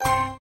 win count stop; games; poker; American Poker The Legends II

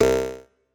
risk lose; Hot Party; Wazdan

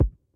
spin stop; Great Book Of Magic Deluxe; Wazdan

